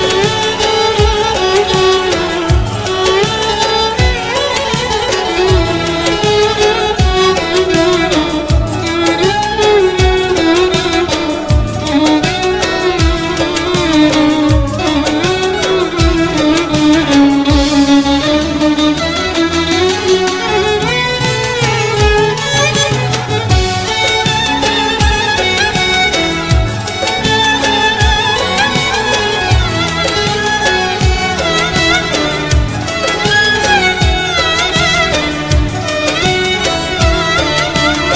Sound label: Mobile ringtone